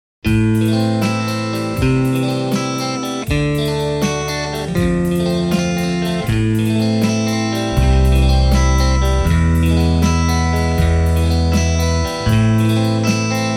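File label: Stratocaster JCM800; mercuriall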